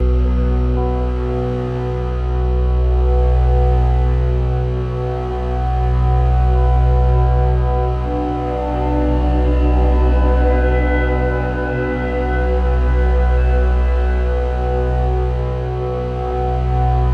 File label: heaven synth pad atmosphere, Ethereal Ambient Samples; Bluezone